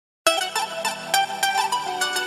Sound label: music game play